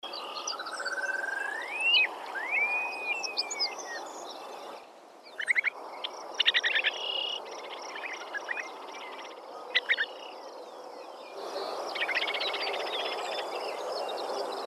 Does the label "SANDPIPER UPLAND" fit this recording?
Yes